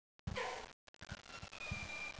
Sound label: noise, squirrel